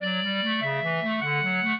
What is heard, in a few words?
clarinet; Mozart